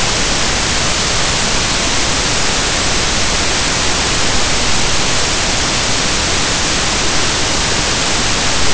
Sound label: noise